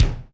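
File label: GATE KICK; Crypton - DrumBase5000; GATE KITS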